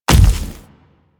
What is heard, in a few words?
Splitter Step Default, Footsteps; Warframe; Infested; Grey Strain